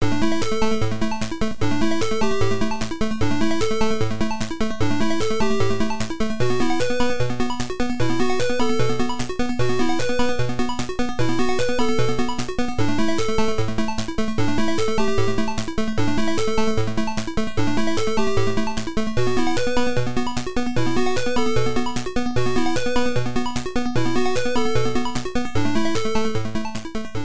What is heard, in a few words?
Area Boss; Aleste; MSX2; PSG